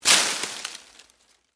Foliage Hit, With, Machete, Clear, Brush, Palm, Frond; Texture; Rustling Sound Effects